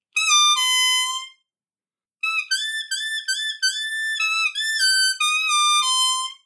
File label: Top End entry lick and phrase A harp; 1st Position Blues Harp; 1st Position Blues Harp – An Introduction (Part 4)